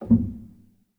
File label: rel, Grand Piano; bitKlavier Grand; Piano Bar